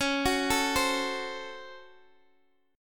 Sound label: Db Major 7th Suspended 4th Sharp 5th, Guitar Open G